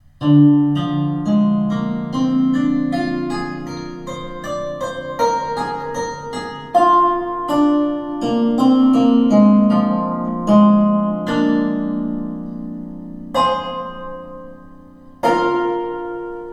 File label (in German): Pentatonik Anhemitonisch Weitere